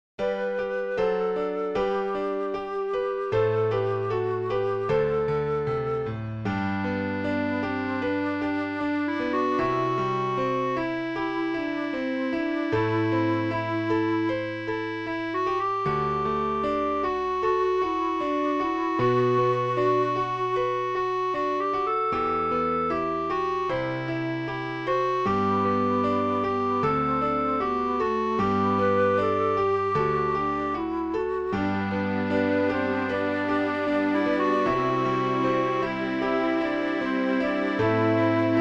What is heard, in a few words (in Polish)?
akompaniament